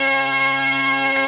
organ F, PIPE ORGAN; ProSamples vol.55 - Retro Sampler; ORCHESTRON

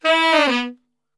E 2 SAX, Brass Super Section; Best Service

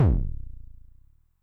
BD MOD [Pitch+Depth+Overdrive], 909mod, BASS DRUM

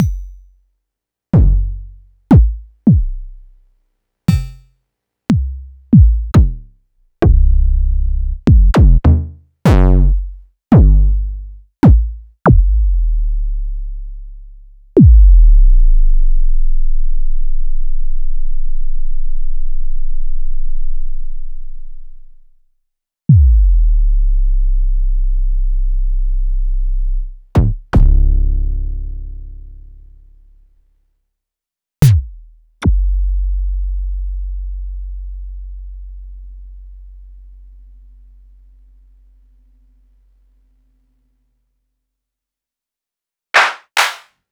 Access Virus TI; Cyberworm

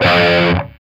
F#3 SOLDANO ROCK, Guitars; Spectrasonics; Hans Zimmer Guitars VOL-1

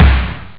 sdrum; Amiga Soundtracker Sample Packs

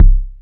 Bass Drum, Cwejman S1 MK2

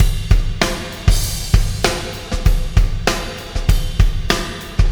Beat, Processed Drumkits; Sonic Foundry (Sony Creative Software); Processed Drumkits Zero Gravity Beats; Solar Flare Beat

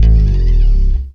BS CHPSTK, Chapman Stick; Roland L-CD701

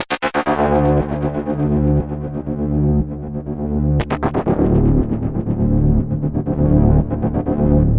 NRG Pad

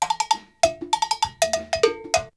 Drumloop; ZERO G - Datafile DISC 3

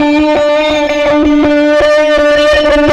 Wah Wah Gtr

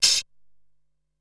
Spray Can, Drum Machines Ensoniq SQ-R-Plus; 300 Drum Machines

